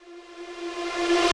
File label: Tonal Reverse - F; House; FX; Ruckus Tonal Reverse